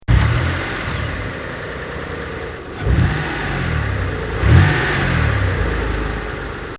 Dodge Stealth Start And Rev Engine

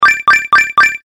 Score Tally Game Loop; Video Game Sound Effects

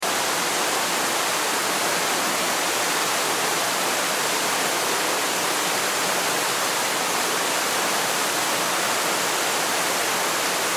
Waterfall Splash Distant Loop; Nature; Blastwave

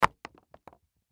Shoe Drop Take Off, Shoe Drop Take Off High Heel; Objects; Foley; Blastwave

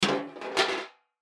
Metal Movement, Metal Trash Can Lid Flip Over; Scrapes; Foley; Objects; Blastwave

